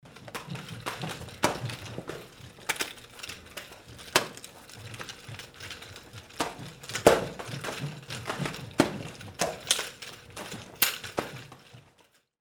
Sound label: karate dummy training